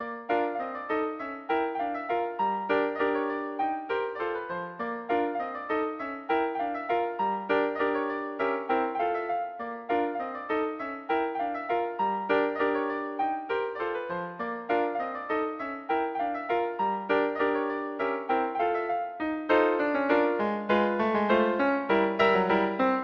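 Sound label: tchaikovsky polka, piano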